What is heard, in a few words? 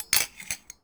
Metal Rattle Grab Tongs; Foley - Rattles